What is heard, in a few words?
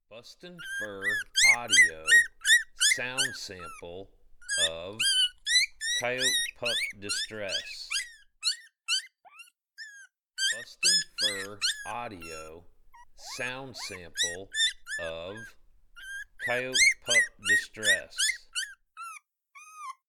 Coyote Pup Distress